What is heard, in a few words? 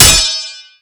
blade 1 hand parry